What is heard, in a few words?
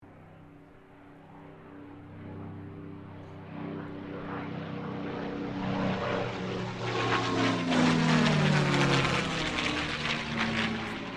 AIRCRAFT CAC CA-18 MUSTANG MK23 PASS WINDY; CA-18 /P-51 Mustang; Airplanes; Vehicles